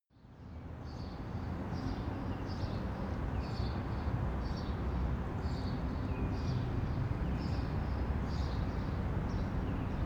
day traffic birds

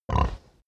pig grunt close

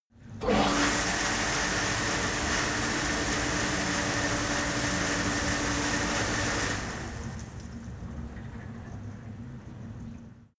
cleaning machine start running stop

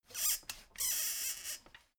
folding chair squeak

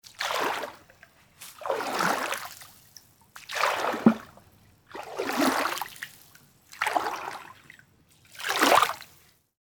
water being stirred

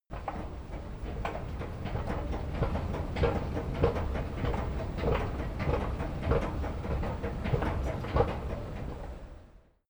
escalator short

